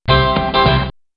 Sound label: harpsichord; "Mystery Sound Bite" Contest